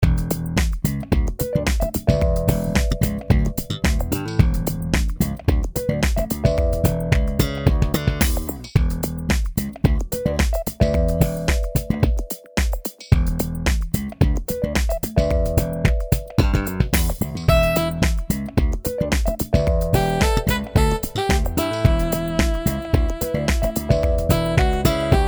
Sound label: funk chase